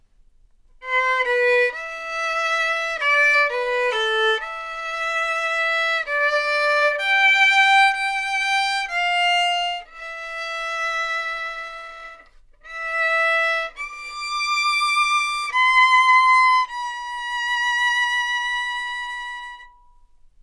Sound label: violin samples Sadness